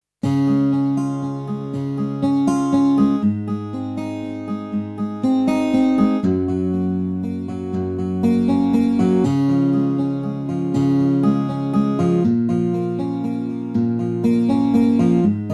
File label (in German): gitarre